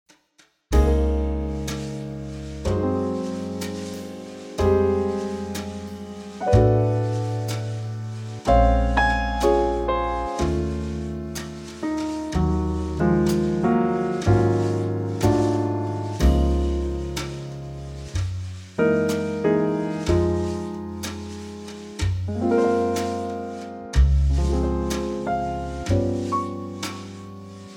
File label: Db Trio; key - Db - vocal range - Bb to Eb (keeps more to the upper range)